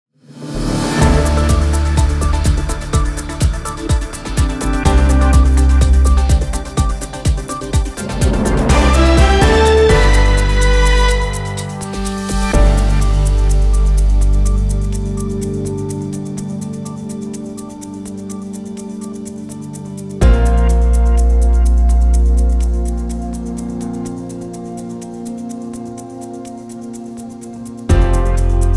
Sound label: Headlines News; Das Inselradio Mallorca